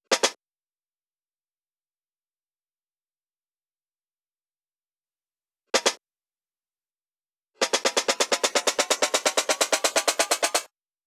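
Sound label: Drum Loop 128BPM snare Fill; VEC5; Drumloops; Multi Loops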